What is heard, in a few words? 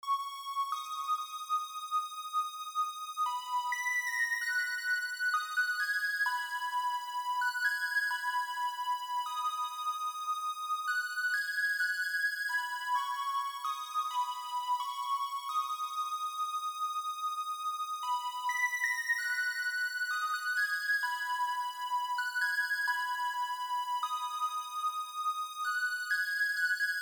pad music explosion